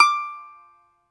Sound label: BAGLAMA D0TR; E-MU Producer Series Vol. 7 – Old World Instruments